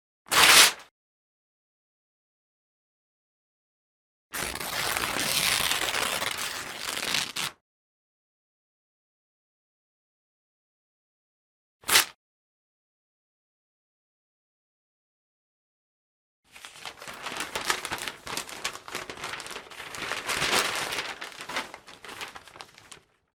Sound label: Ripping Paper; Sound Effects